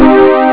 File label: lead; Amiga Soundtracker Sample Packs